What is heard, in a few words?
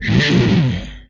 Inhuman Attack, Vocal; retro arcade